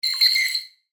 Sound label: Alien Bird Chirp, Scifi, Alien, Bird, Chirp, Science, Fiction; BlastWave